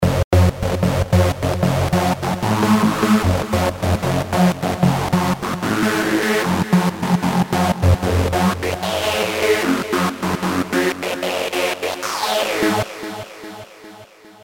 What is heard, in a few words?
Double HP Lead